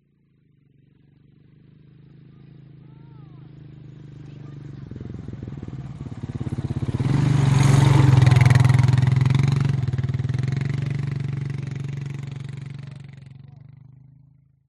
vehicles atv quad bike pass by children ride light talk as pass; zapsplat